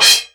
cym hi choked

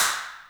big clap; osu

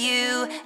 vocal

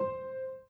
Piano Chord

